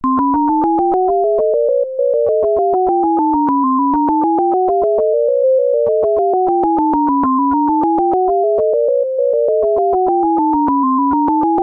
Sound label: Chromatic Illusion, musical illusions; Deutsch; auditory demonstrations